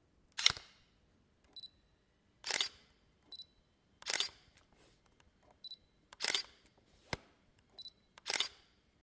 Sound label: Taking picture with flash